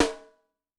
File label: Hip Hop, Yamaha DTXpress-II snare